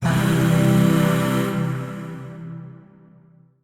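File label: Void Shawzin Major Chord; Warframe; Zariman; Chords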